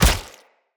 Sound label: Husk Impact Flesh, Unarmed; Weapon Impacts; Enemy; Mockery Husk